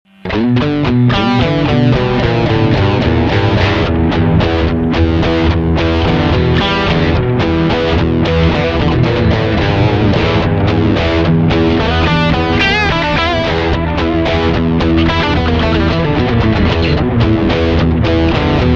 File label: MAXON AD999 Long delay